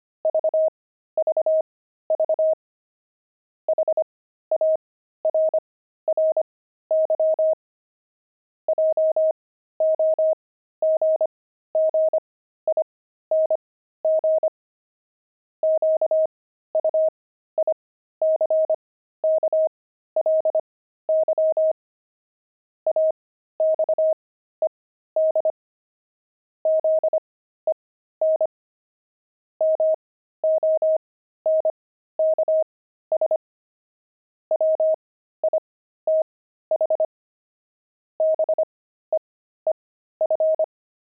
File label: pangram, intermediate pangrams files